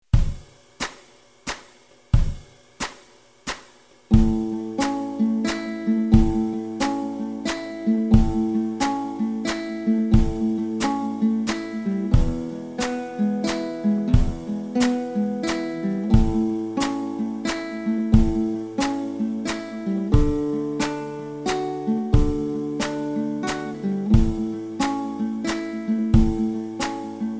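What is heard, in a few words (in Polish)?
cicha noc podkład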